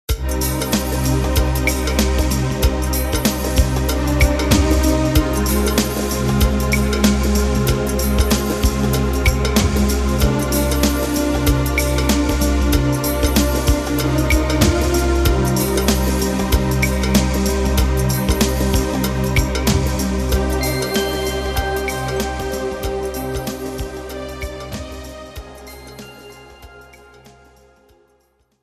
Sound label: ice drops ledove kapky